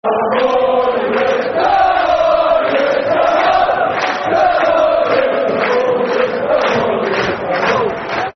Come On You Reds [Football Chant (Soccer Song)], Southampton